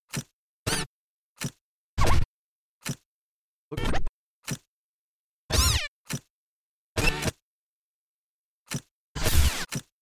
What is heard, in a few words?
Channel Change Rotar